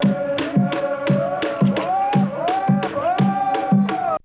VOC

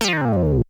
STAB; ZERO-G; Datafile

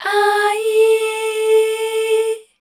Voice Spectral MELODIC A

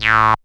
SYN TON, ARP SYNTH; E-Lab; X Static Goldmine 2; akai

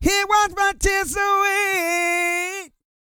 GOSPEL; Spectrasonics; Vocal Planet Vol.1 Gospel; akai

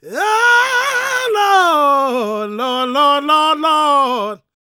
GOSP MALE, Vocal Planet Gospel

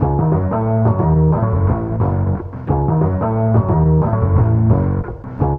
ORG LOP; INSTR LOP; E-Lab; X Static Goldmine 2; akai

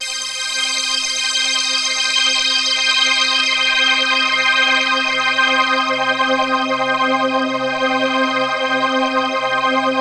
PHASE PAD, ATMO PADS; Best Service; XX Large

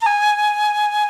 FLUTE

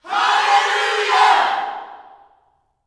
ALLELUJAH, Vocal Planet Gospel YELL SHOUT; Spectrasonics; Vocal Planet Vol.1 Gospel; akai

